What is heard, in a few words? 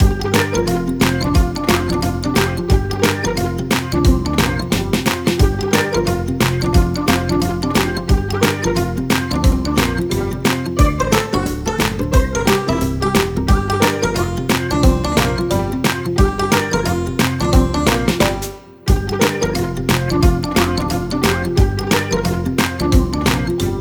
cheerful banjo; by burak sound bank; cheerful vol 20